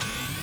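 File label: minigun power up; Allegiance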